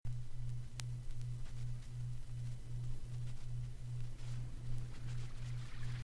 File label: noise click pop